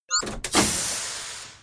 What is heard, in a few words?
floor button; star-wars-rebels-team-tactis